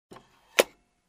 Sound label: Closing compartment